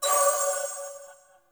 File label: Choir Bow Charge Full; Warframe